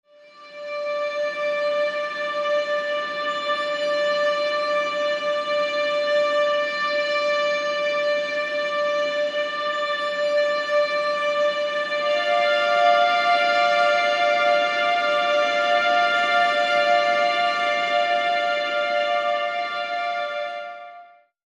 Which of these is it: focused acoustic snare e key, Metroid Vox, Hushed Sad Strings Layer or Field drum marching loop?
Hushed Sad Strings Layer